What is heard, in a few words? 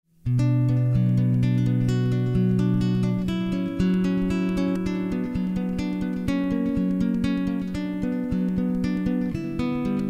Study In B Minor